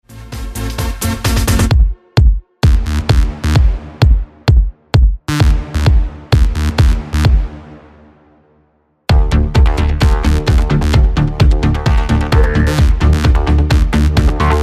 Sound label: dance music